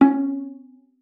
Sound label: C#4, VIOLA PIZZ; Xsample VOL-5